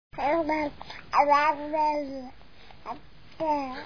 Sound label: babbling on; November 28: Much better developed babbling